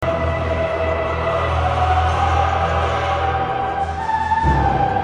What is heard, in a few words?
Game Tune